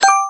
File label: click